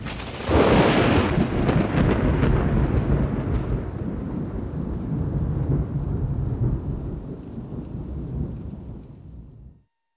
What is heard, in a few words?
Amb Thunder; Paranoia 2 Savior